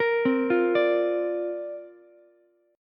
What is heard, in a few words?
C 9th Suspended 4th Ukulele C6; arpeggiated; C9sus4; 15,12,13,17